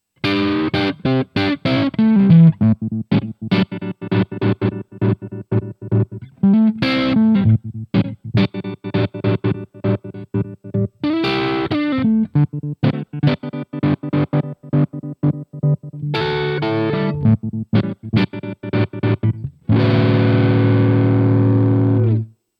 Slicer Beispiel

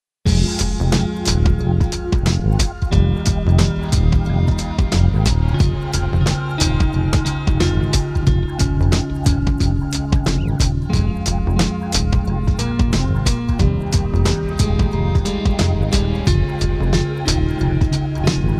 Roland SP 404MK2 Drums Bass Gitarre

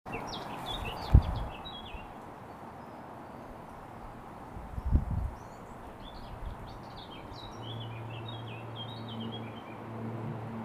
Aussenaufnahme, Zoom H4n

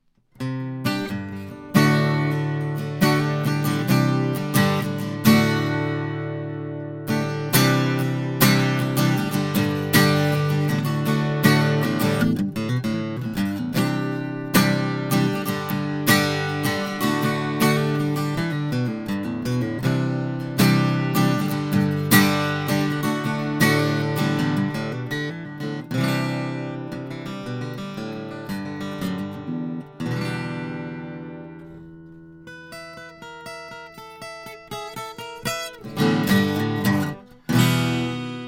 sE 2200 Gitarre

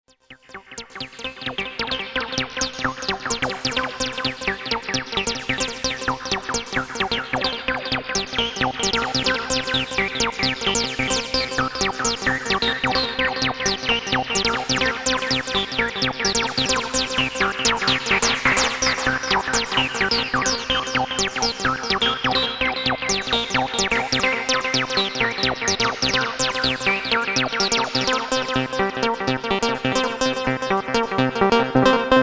mit Delay and Reverb

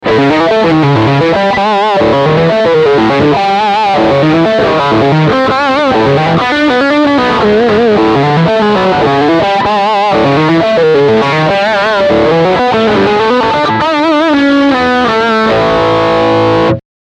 Riff Bridgehumbucker

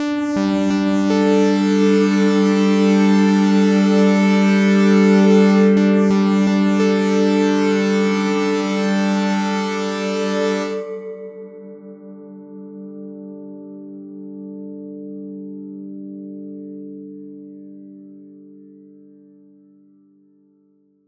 Gong Pad Drive Trip 8 Takte; AudioThing Hainbach Bundle

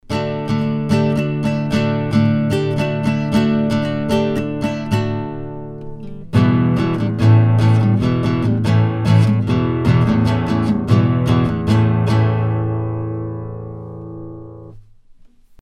Sontronics STC 2 Niere flat Gitarre

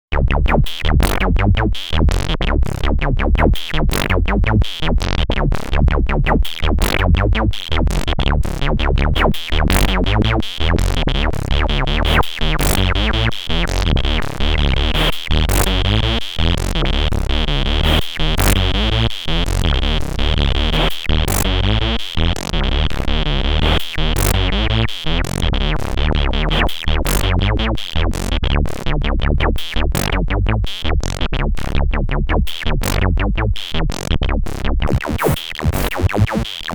Dreadbox Polyend Medusa Acid Variationen

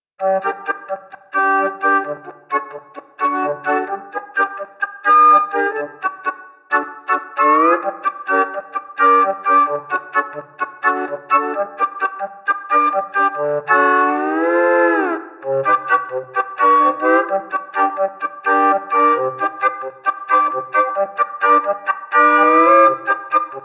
Kirmes Orgel

